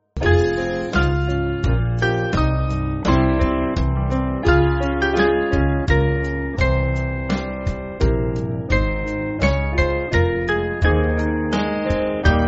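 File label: Band, Backing Files for Churches; O Jesu King; Kings Norton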